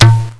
gp25 fire, weapons; firearms

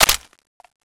clip release; svencoop

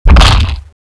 bite; gearbox; renesaur

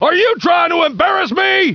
rifle

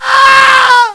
grunt die; svencoop; cncgrunt

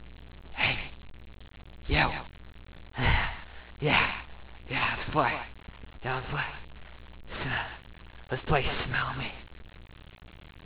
whisper; tfc; Midevil

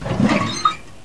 open lid; svencoop; saker

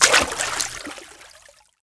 player slosh

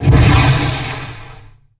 rocket, ambience; dod

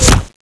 body hit

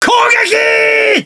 attack; gamesaba

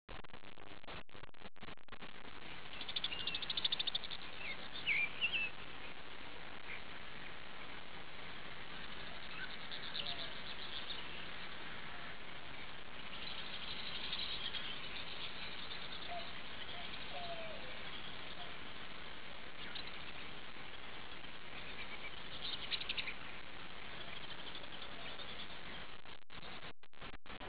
birds low

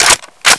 G43 bolt pull; dod

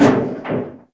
duct, player; firearms

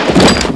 bomb set; dod; mapsounds

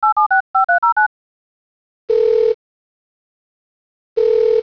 ringout

